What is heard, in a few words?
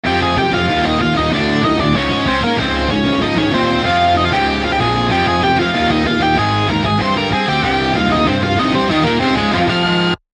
solo harmonic